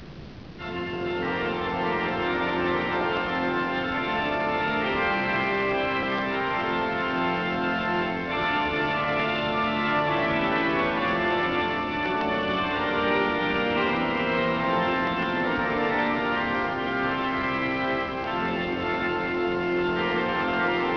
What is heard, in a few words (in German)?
Orgel; Meitinger kirchen